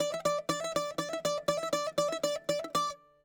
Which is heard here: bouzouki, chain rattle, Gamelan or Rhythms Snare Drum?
bouzouki